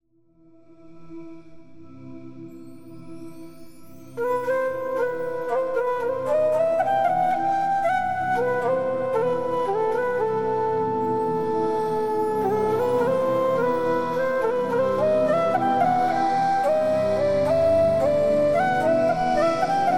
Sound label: Egyptian Flute; Arron